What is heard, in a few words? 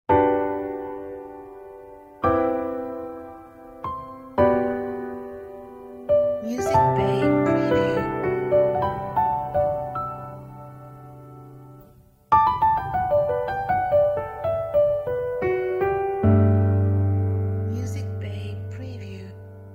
Piano Logo